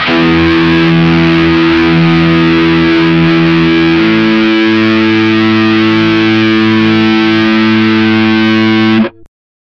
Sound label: distorted electric guitar